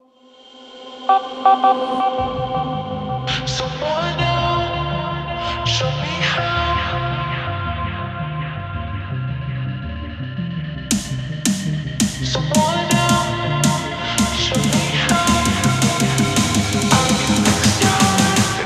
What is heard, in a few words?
slowed + reverb